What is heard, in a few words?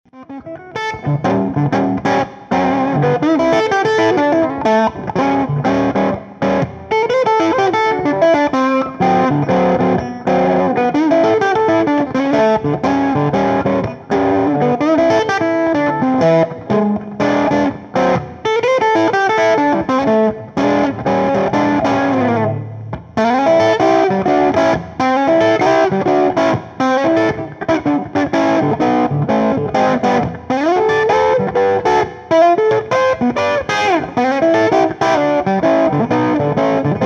Blues, amps